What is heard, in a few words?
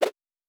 Whoosh; Fantasy Sounds Bundle